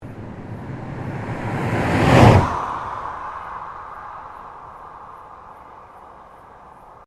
Car Ford Focus Driveby

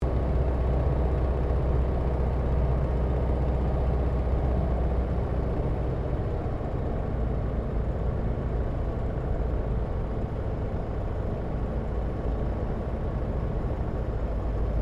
1916 SOPWITH PUP REPLICA LANDING INTERIOR; Airplanes; Vehicles; Replica of a 1916 Sopwith Pup

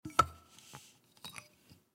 Trumpet Insert Mute; Musical; Blastwave

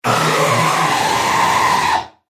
Dinosaur Epic Lizard Monster

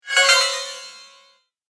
Game Cursed Spell, Video Game Sound Effects

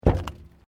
Footsteps Boots Plate Metal Step; Footstep Foley; Footsteps (Sound Effects:Human)

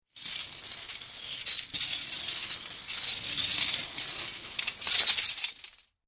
Chains Rattling And Clanking Together; Chain Sounds; Metal Sound Effects

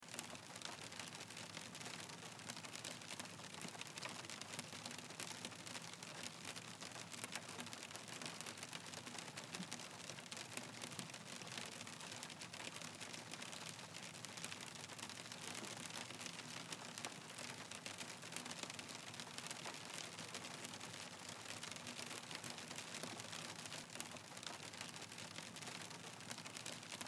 Hail On Car Windshield Interior Loop; Weather; Hail Sound Effects; Part of Hail (Sound Effects:Weather); Blastwave

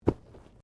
Bodymovement Fall Light

